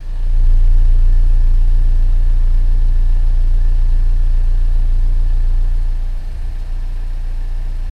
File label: Engine Running By Exhaust Bmw 118i